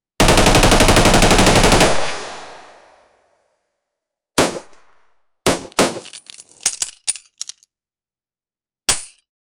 lower volume shotgun fire; firearm

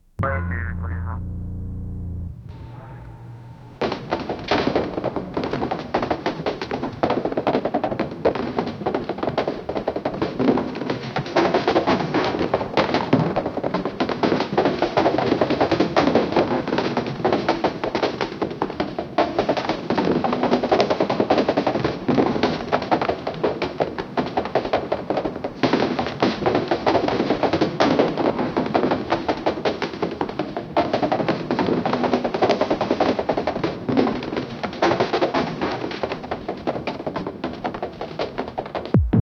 chaotic move style chase, hard floor

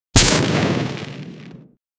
sound of a flaming arrow, fire

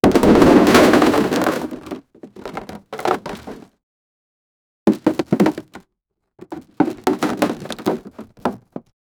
wooden boxes fell with

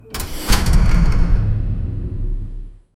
spaceship airlock door op

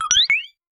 soft bubbly pickup gentle; healing

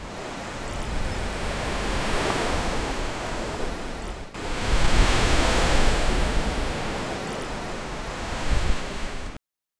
kite surfing fast over se

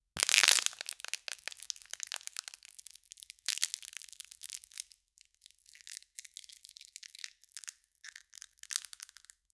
hollow plastic crunch

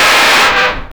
blast sound

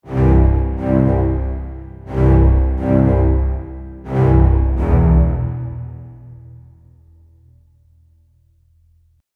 trailer tension a single, abrupt ending

